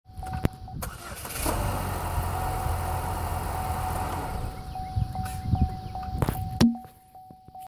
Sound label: suspected fuel leak engine off sound